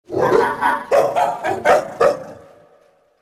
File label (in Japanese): doubutu